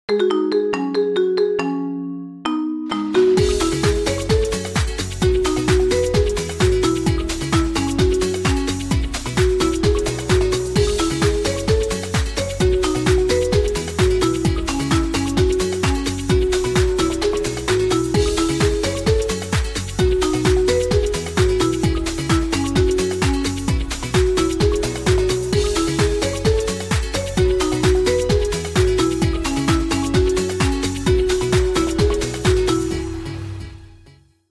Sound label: ringtone marimba